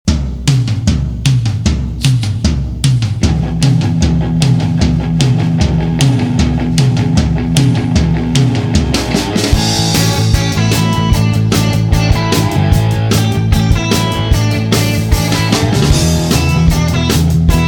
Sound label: tubes